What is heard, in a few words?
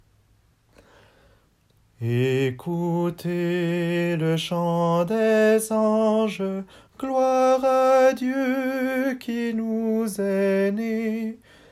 soprano; ecoutez le chant des anges